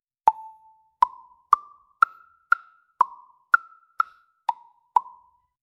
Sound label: whimsical humming light f, ascending notes